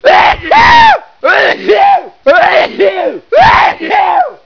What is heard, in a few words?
sneeze attack